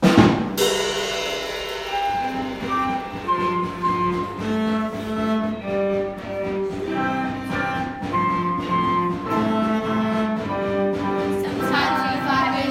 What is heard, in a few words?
House Music